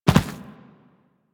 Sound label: Inf Medium Land Default, Infested Footsteps; Warframe